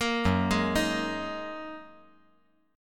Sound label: Gb 6th Add 9th Gb down